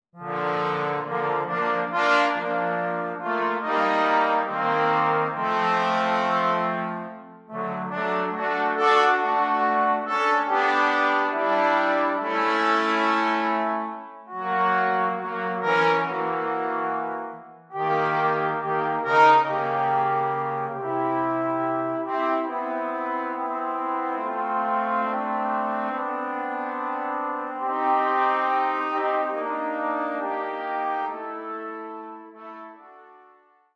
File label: Fanfare, 3 trombones